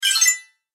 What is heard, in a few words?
Magic Spell Twinkle; Magic Sound Effects; Andrew Fleming